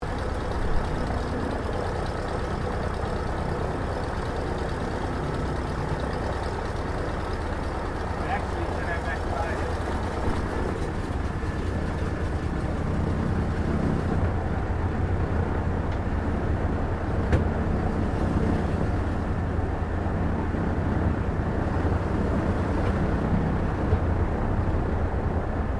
AIRCRAFT PBY CATALINA IDLE INTERIOR, Catalina Flying Boat; 1942; Airplanes; Vehicles